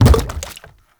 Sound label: Gas Tank Impact; Gameplay; Warframe; Nineteeen Ninety Nine; PvPvE